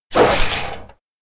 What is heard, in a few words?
aircraft takeoff; anemoi